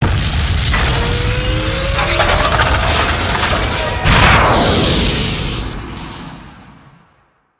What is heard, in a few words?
other launch; Cosmic Rage; larkan